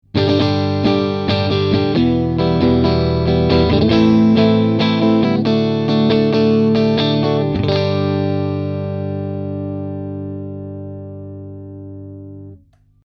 Strat Am Pro strum medio mastil

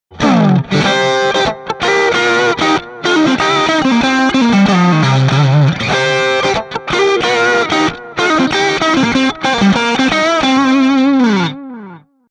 Licks Neck

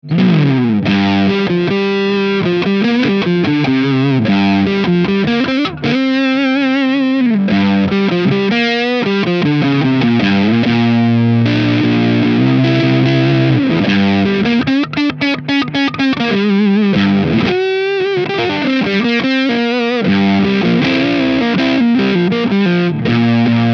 hx fuzz triangle

